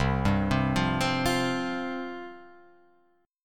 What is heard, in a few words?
C Suspended 4th C, Guitar Open F; Csus4 arpeggiated; JGuitar